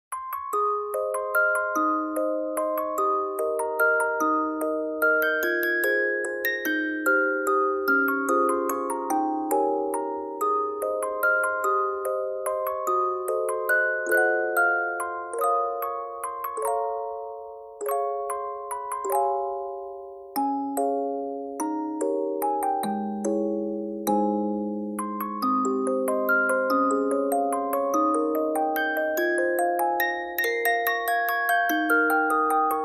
Preface Music Box

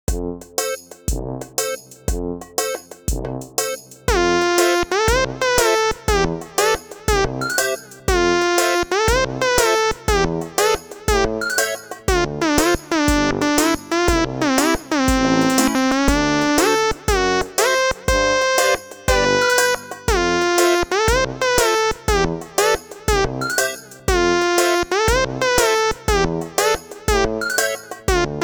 Usual members, bgm

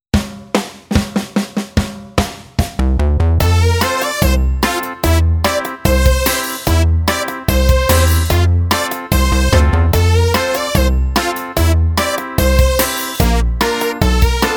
usual time

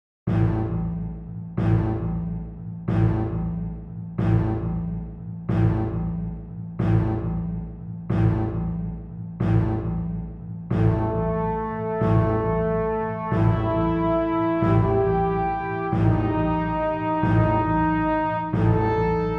Horror suspense

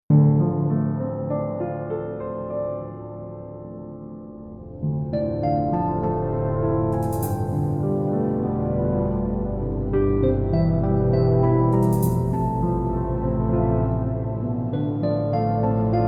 Universe, bgm

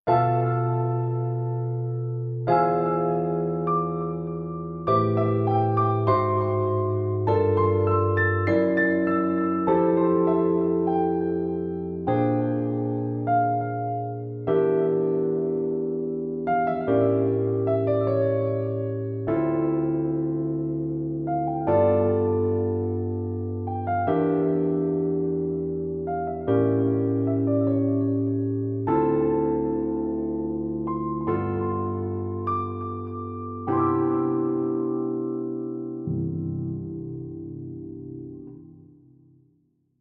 planetarium, bgm

